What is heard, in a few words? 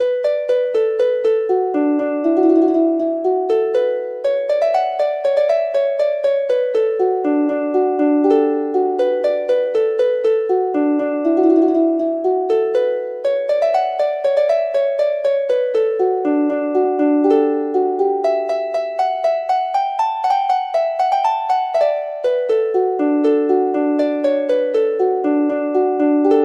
harp